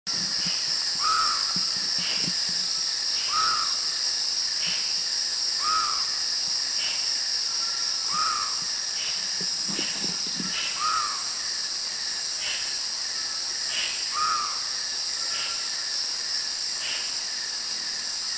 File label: Javan Frogmouth, Thailand